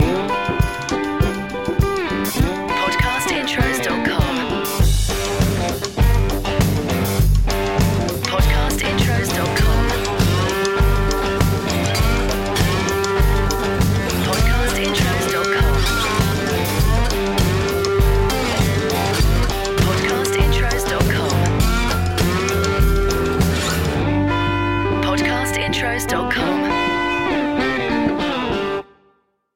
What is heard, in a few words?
BLUES; Podcast Watermark